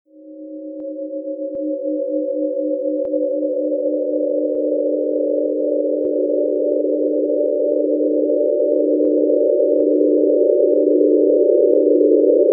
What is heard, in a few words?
death sound; easyrpg; WitchsHeart